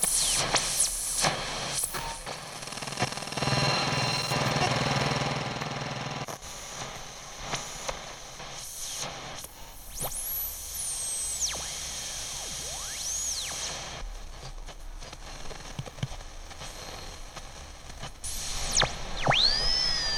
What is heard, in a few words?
jammer